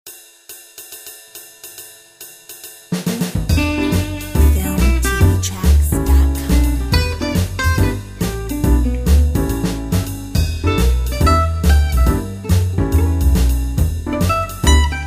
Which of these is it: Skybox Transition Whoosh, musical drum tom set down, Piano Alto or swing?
swing